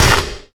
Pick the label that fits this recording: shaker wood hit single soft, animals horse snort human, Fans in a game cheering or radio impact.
radio impact